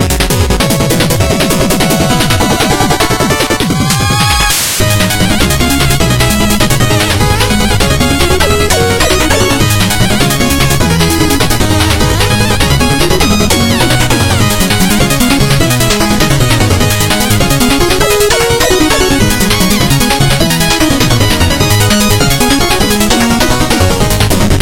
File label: Music Boss; Boss King Knight; Shovel Knight King of Cards